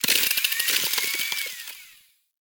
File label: Cave Hi Tech Clicks; Ambience; Warframe; Stalker